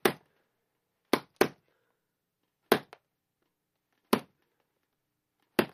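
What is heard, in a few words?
Boxing Gloves Hitting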